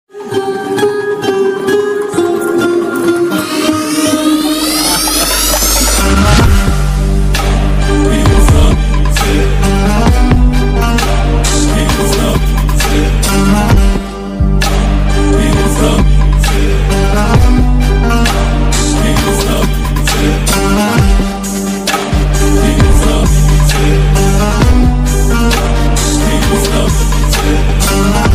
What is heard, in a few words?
ringtone bgm; mersal